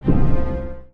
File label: UI Toggle